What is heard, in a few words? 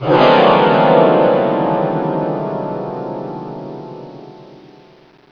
tension; Thief